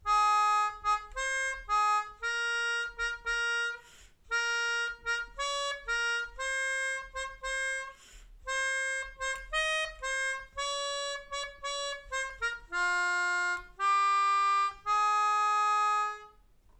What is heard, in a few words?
Melodica